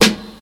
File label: short snare drum sound A key, snare single hit tuned to the A note